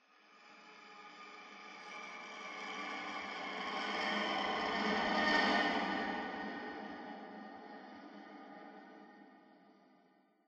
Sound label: Combat Crack